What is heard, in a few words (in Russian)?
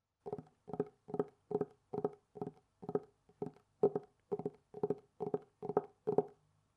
stuk palcev